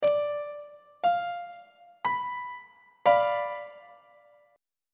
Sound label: b diminished 1st inversion; on treble clef